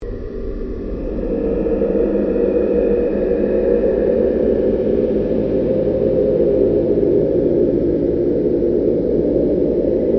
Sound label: Ambient Drone No Sweep; Sound effects created by manipulating other sounds; Drones; Sound Design